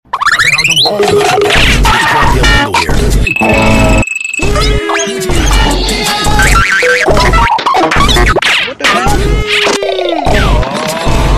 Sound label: goofy ahh sounds all